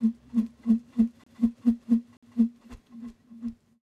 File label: ETHNO 125, FX